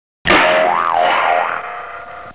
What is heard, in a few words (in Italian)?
Molla, cartoons